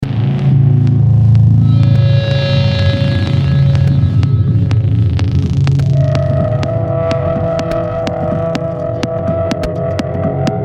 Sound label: Techno; Netlabel Day 2023 Techno Warriors